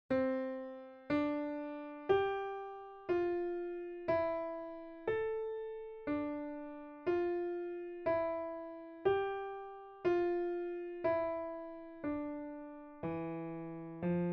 piano

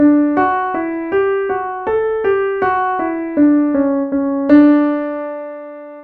melody

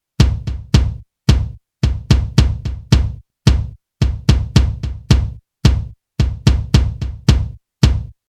Kick Drum medium compression; Drums 1c: Kick Drum, medium compression

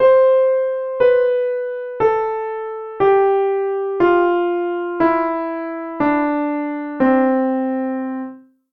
C Major descending; Scale Recognition

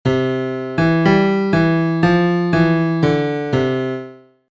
A Simple Song

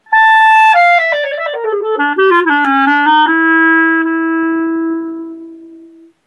Doina Fragment Free Rhythm; Klezmer

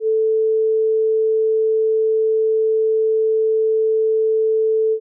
a 440 sine wave

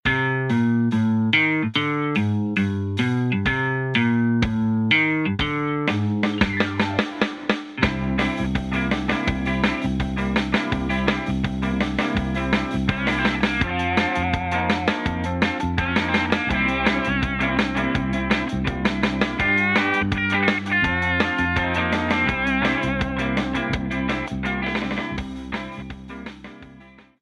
Rock; Summer School

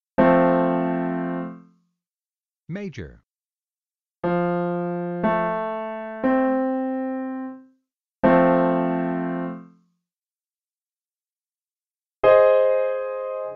Major triads

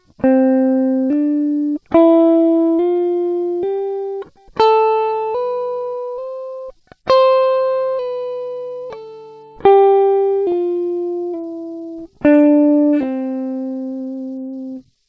scale example

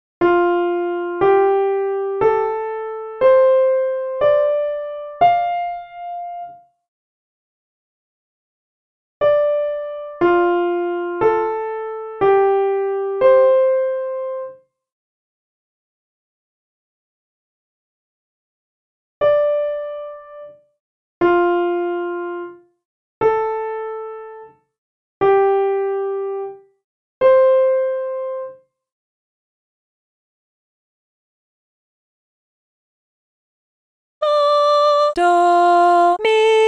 Hard Solfa Melody; Hard Melody 3: Hint

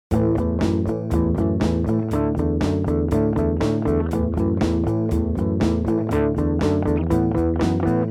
Dorian Mode Ear Training

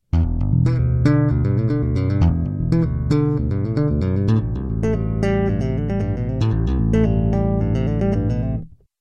Electric Bass Advanced Tapping Riff; Advanced Tapping Riff Example

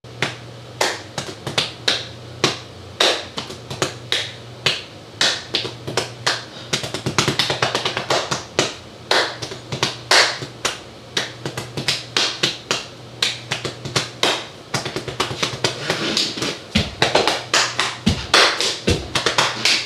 Bodyslap; Body Slap Improv

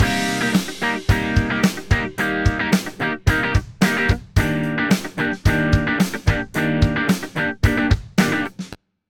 rock clip

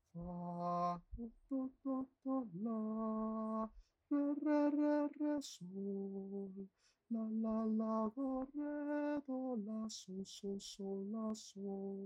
Sung; Exercise 3

